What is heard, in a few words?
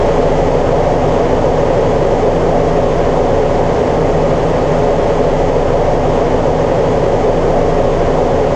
A-10 Cockpit Plane Wing Turbulence In; DCS